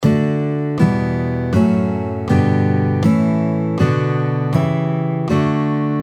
D Major Chord Scale

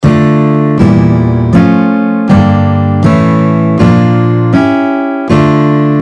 D harmonic minor chord scale, guitar

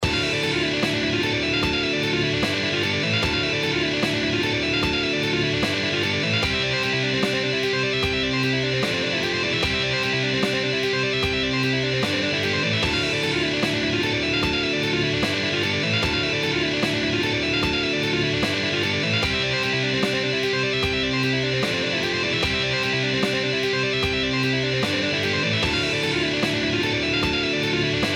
Chorus Lick

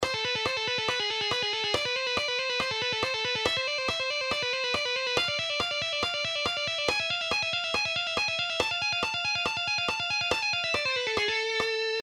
Shredding Exercise

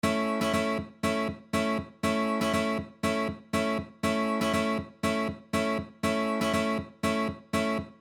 Rhythm, guitar

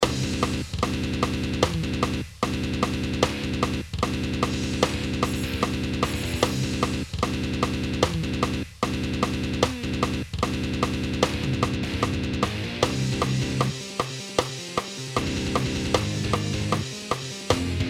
metal riff breakdown

